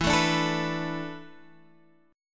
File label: E 7th Suspended 4th, Guitar, Standard; E7sus4 strummed; x,7,7,7,10,7; JGuitar